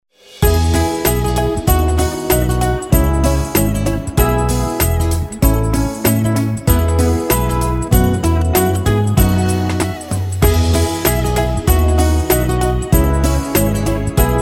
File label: JINGLE BELLS MANDALIN